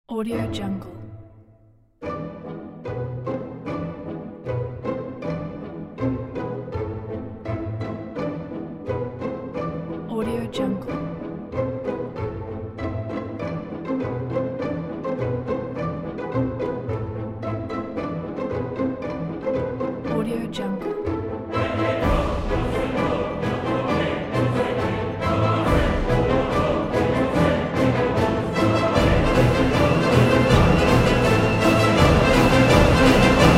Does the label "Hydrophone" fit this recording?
No